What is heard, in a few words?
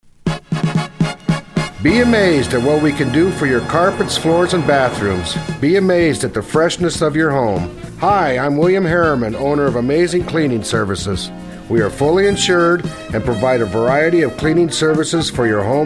cleaning fanfare